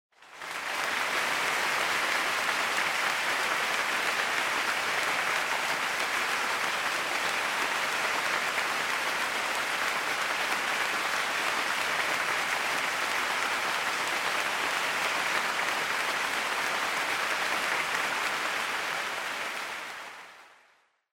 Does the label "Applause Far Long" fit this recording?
Yes